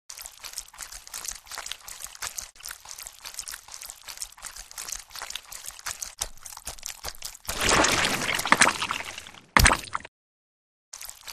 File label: slosh; Lewd SFX